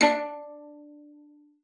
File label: D4, VIOLA FINGER; Xsample VOL-5